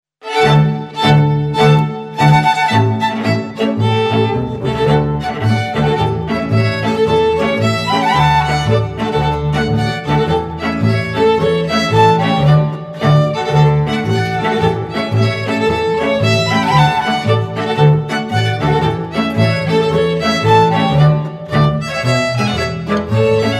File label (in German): polka; auf auf